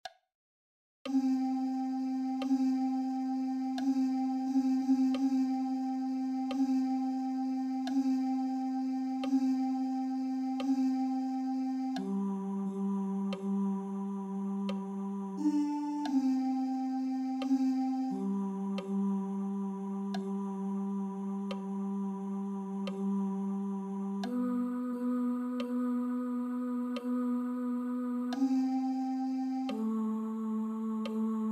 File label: tenors